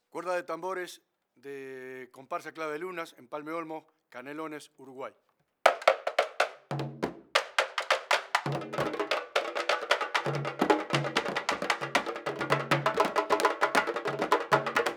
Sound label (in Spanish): COMPARSA LUBOLA UY Tambores; CLAVE DE LUNAS